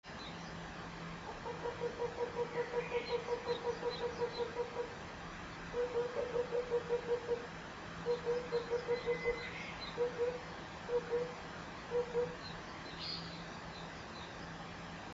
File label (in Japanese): tsutsudori, bird; ホトトギス目; ホトトギス科; ツツドリ （筒鳥）; 『ポポポポポ』; 社務所裏山からの鳴き声を録音 鳴き声; 蘭梅山; 野鳥